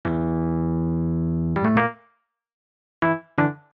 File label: Rhodes 130 E